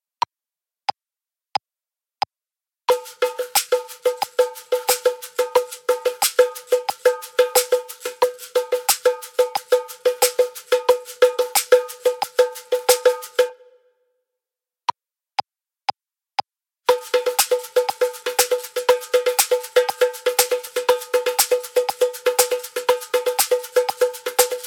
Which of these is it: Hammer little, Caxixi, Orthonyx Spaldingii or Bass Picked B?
Caxixi